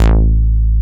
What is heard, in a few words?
DIGITAL BASS, BassGuitar